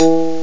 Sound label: slap bass